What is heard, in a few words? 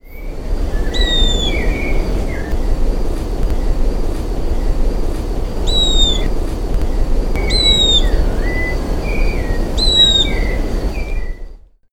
Myrmotherula unicolor; R Verde; EE Jureia; SP; Brazil Silveira